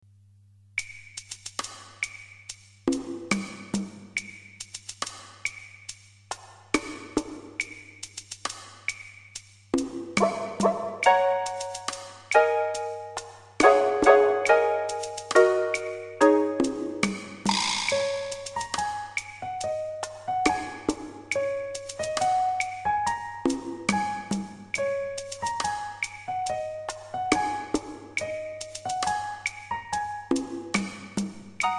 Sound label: oriental style fantasy